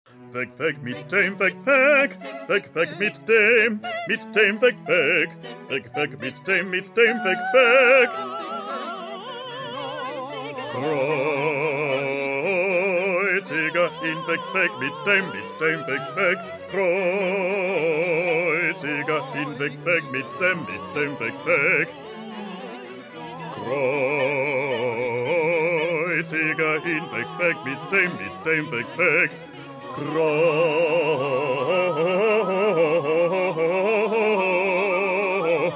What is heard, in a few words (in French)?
PETIT CHOEUR BASSES